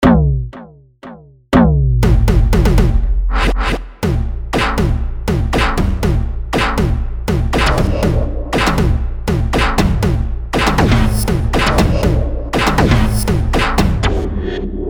SQ80 Percussion Pitch Down Modulation Sequence Reverb; EIII